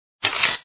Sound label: camera; super racer